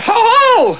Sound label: hoho; Mark Radcliffe and Lard